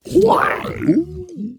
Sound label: WATER SWAMP